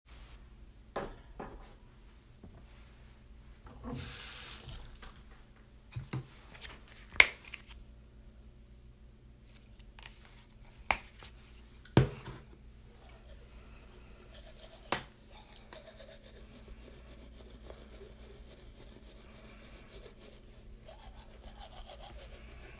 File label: ASMR Brushing my teeth Water sounds Minimal spitting noises